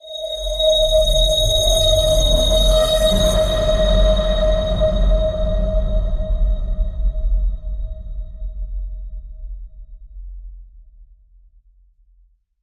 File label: Tinkering